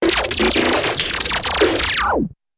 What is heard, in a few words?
Synthy Beeplike Zap, zaps; Synthy, Beep-like Zaps ( Machine Gun with Multiple Targets Being Hit )